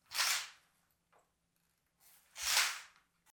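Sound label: sound of curtain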